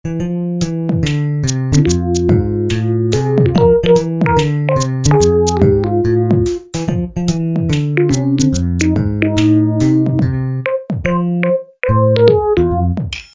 Mini Game Loop; Mouse DS; NDS

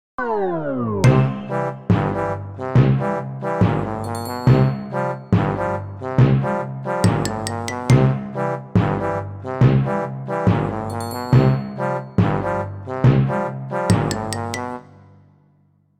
Game Over Jingle

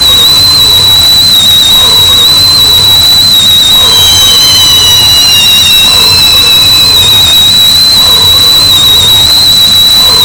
rocket fly

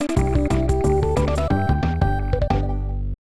Stage Clear; Donkey Kong Country 3; SNES; Pipes

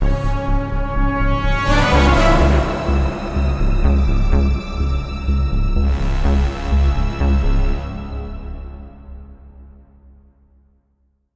Tension Interrupt; mass effect 2; Dantius Tower Level 3

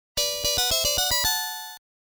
Extend Jingle; Aleste Collection music album

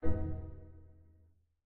GUI Sharp Activate

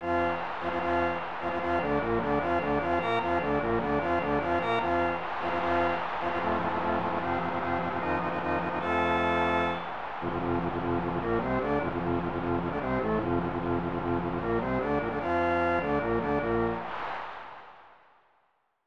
Baseball Fanfare; Tecmo Super Baseball 1994 SNES

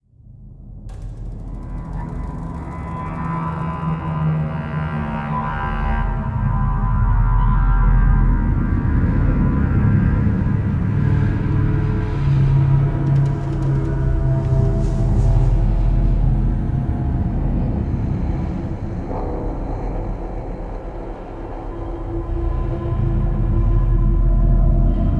Ominous Tension; Mad Max

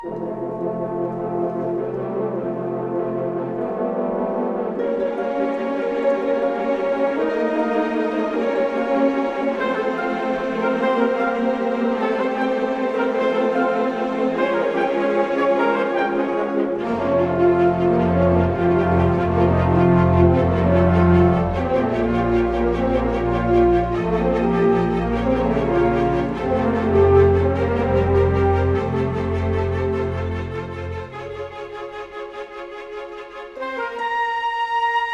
MUSIC Normal; The Incredibles; GameCube

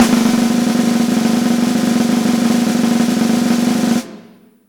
Last Battle Drum Roll; Picross X Picbits vs Uzboross

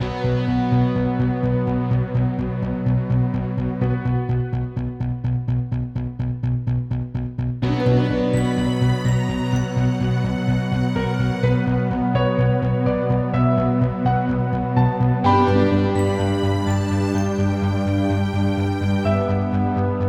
Lobby

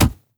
Block Hit; Afterbern Democralypse; Windows

